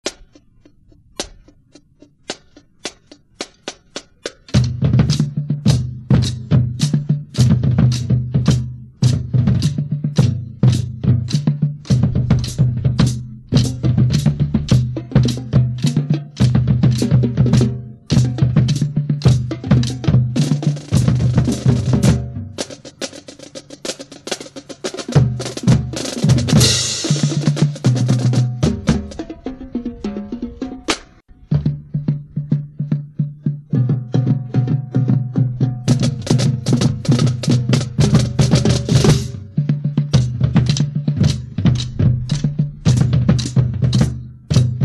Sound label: drumline